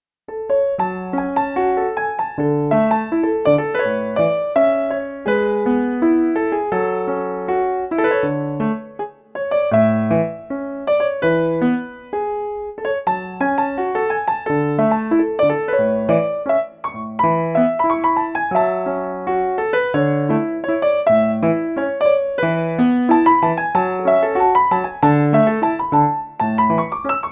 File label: piano